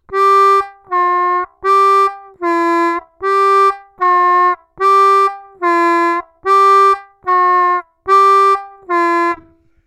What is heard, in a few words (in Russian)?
отрывисто, губной гармошки; 2 -2’-2 -2’' -2 -2’-2; наработать точность и контроль над промежуточными бендами